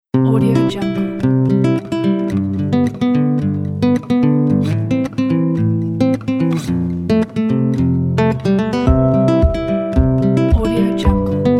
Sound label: acoustic guitar groove